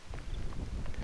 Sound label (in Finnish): ilmeisesti viherpeipon ääni; saaressa